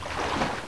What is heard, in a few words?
water walk